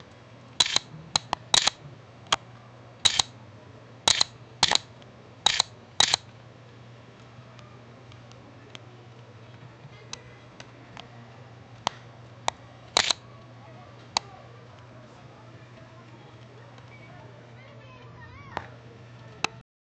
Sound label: paparazzi camera